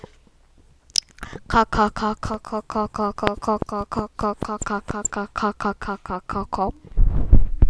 car

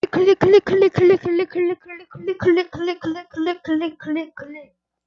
click

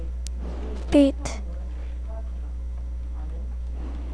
beat

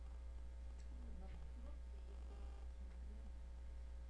fire

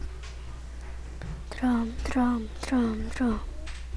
drum

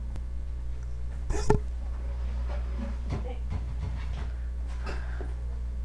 crowd

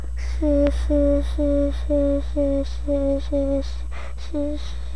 sea

